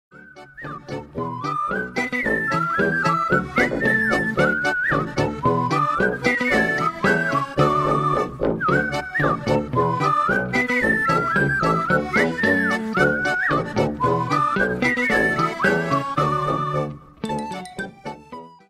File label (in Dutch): wachtrij muziek; max moritz; efteling muziek